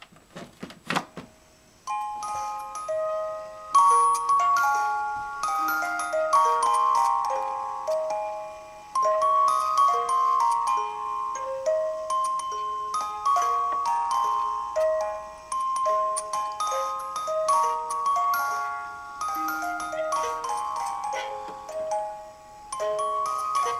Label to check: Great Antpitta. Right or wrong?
Wrong